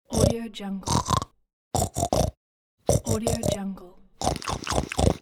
cartoon pig

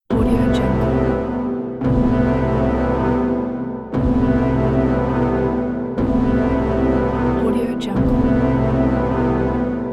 the alarm

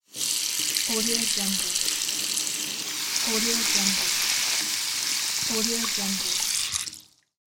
running water in sink

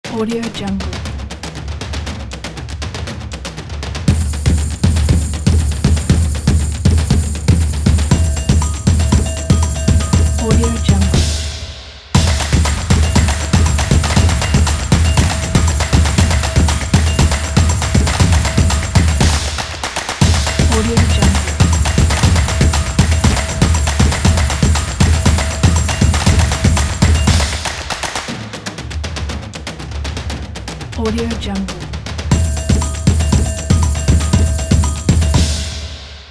a clap

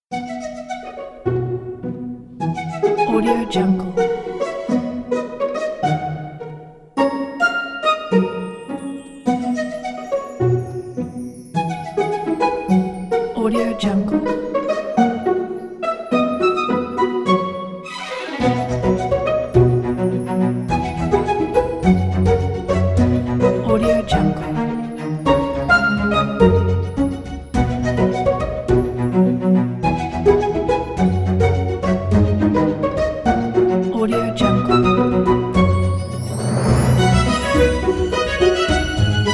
the cartoon story

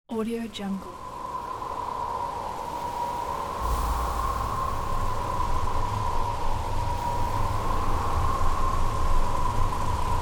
raging hurricane

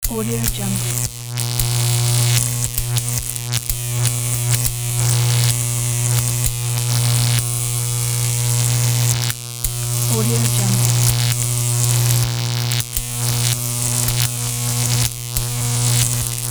power line buzz and hum

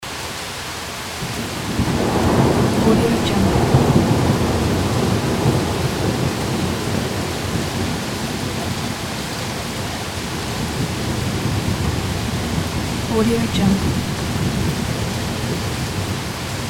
thunder with rain loop

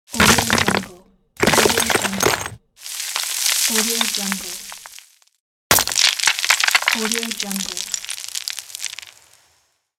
rock fall noises

